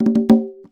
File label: Fill, Conga; musicradar